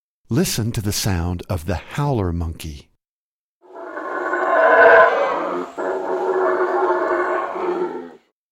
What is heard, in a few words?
Howler Monkey Sound